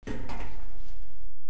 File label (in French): pas homme metal; Time Machine; Bruitages